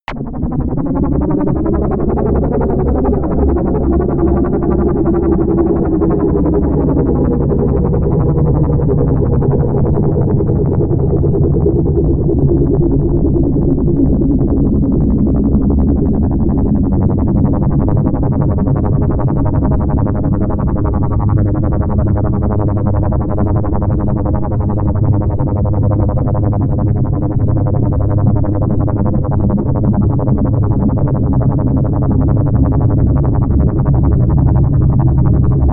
atmosphere ufo

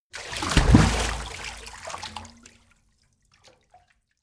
splash strong, Badezimmer; haus garten

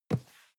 cardboard box medium grab slide; objekte

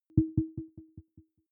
button echo tonal; signale

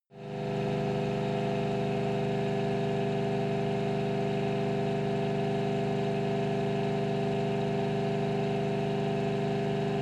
car camaro high rpm exhaust

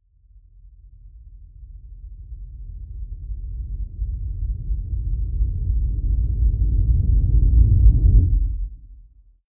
deep noise synth rumble sub, Risers; whooshes rises

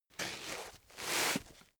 door mat wipe shoes; haus garten; wohnen

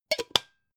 pot lid close harsh; objekte; topf

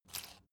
salt mill squeak soft; berufe; gastronomie

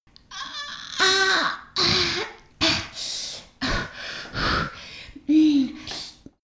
female exert; koerpergeraeusche; menschen; soundpool

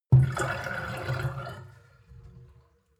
sink filled pull plug short; industrie-wirtschaft; gastronomie

